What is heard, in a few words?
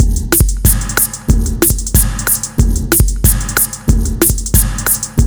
loop fast drum